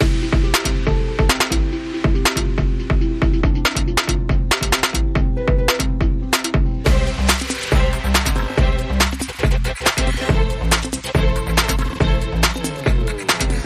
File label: Classical Fusion